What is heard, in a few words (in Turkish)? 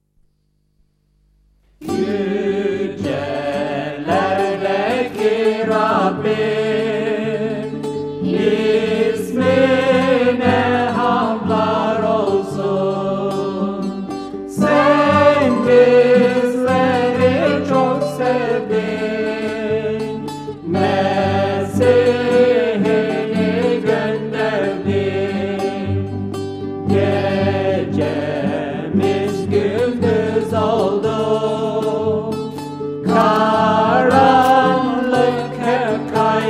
Ezgiler; Turkish